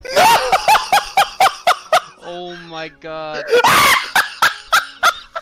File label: laugh